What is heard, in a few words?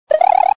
Spin jump